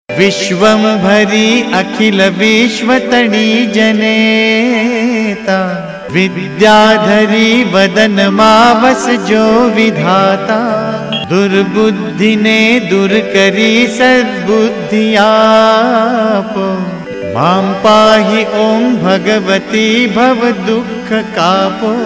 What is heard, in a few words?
durga stuti; Navratri Special